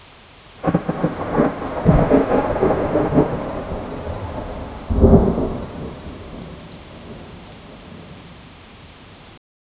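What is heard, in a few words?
heavy intense and continuous, strikes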